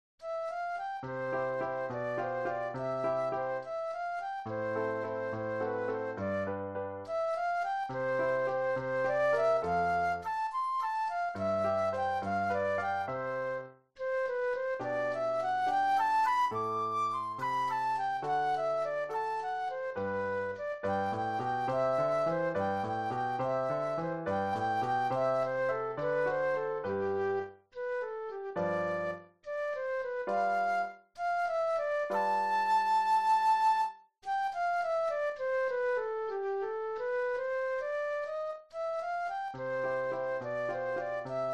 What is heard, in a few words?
Romance Flute Solo; classical; Beethoven; anh. 5 no. 2